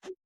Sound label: Cursor Click